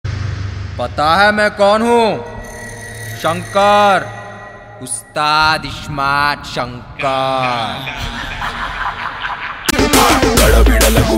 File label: Title Song Song Ringtone; telugu movie